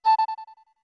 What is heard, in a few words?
Notes Flute A short